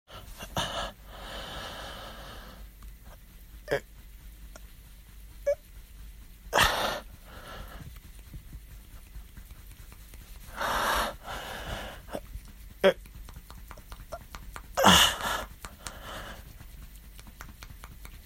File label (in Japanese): イク寸前の声; 実演オナニー; スマホ収録